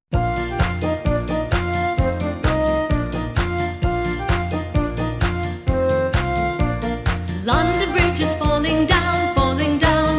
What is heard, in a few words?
London Bridge Is Falling Down, английская песня-шутка